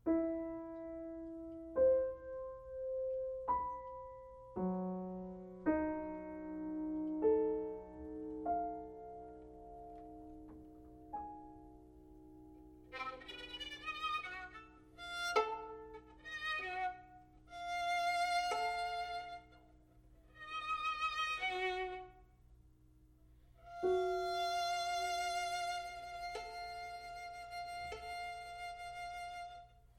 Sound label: Sonata for Violin and Piano - Mvt. 3. Quarter=50; Walker (George)